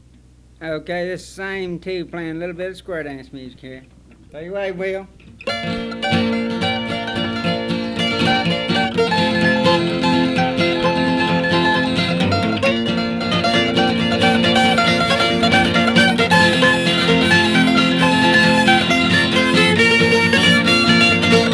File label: Ragtime